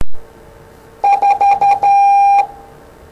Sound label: Morse 4